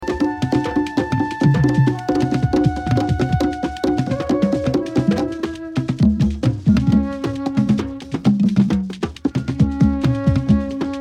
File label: (Flute Dub)